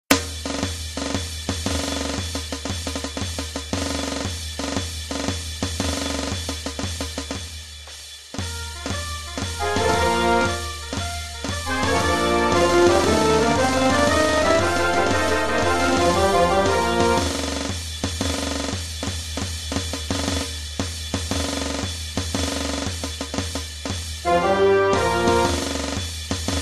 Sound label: marching drums